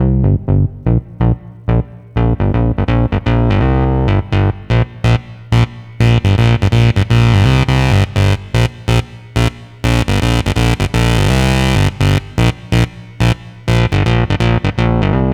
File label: ARP 125, House arp loops; Best Service ProSamples vol.10 - House; AKAI